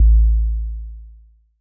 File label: kick 808 Am warm; Kicks; single hits